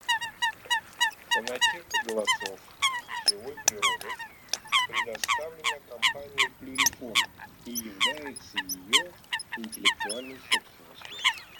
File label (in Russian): Лысуха Folaga; plurifon